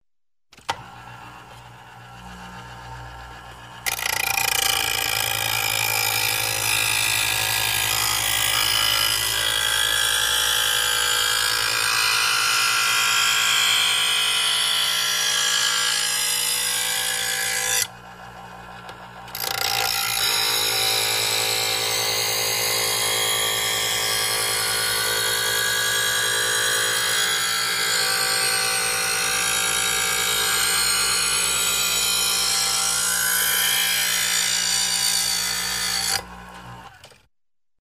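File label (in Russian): звук электрической ножеточки заточка ножа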